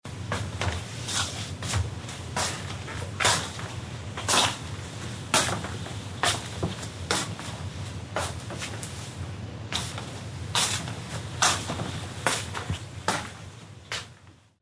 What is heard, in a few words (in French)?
Pas pantoufle